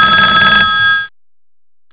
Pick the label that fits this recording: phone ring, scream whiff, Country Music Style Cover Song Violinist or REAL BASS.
phone ring